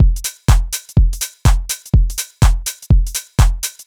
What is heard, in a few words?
Syncopated Hats